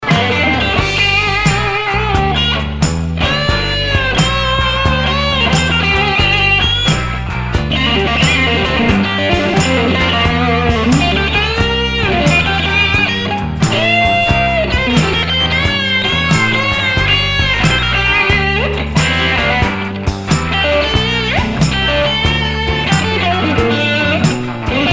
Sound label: short blues